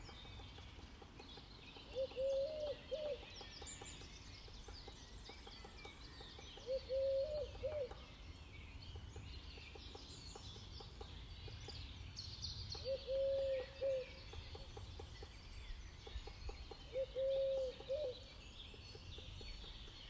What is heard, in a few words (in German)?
Stimmen am Morgen